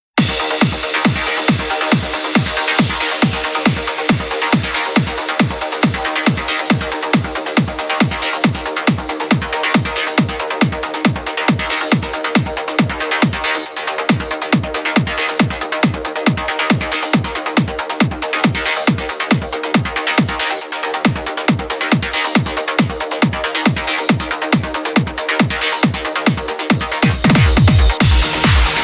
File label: instrumental